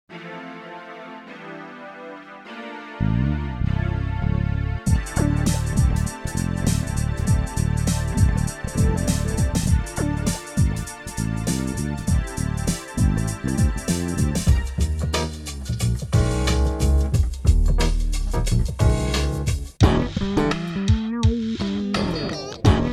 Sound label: Funk Bass; DANCEPACK2; Funk Bass Demo